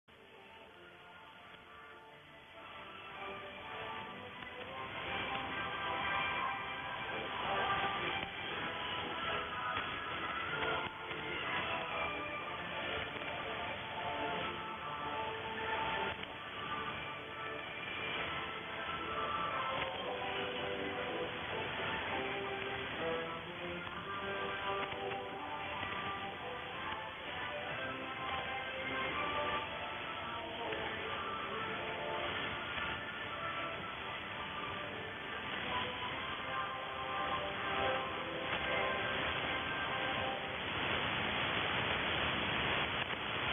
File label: anthem and off; Voice of Korea